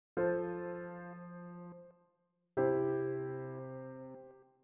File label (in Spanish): c. plaga do M; cadencias